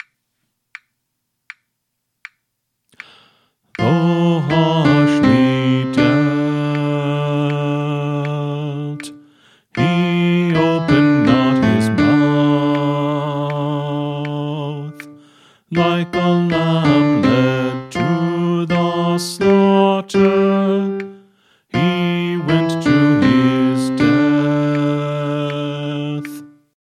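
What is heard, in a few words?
Song, Choir Practice Tracks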